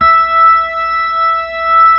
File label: B-3, Keyboards